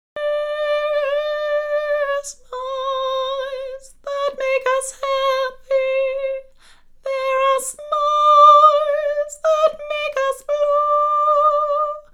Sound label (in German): Tenor ganz allein, Singalongs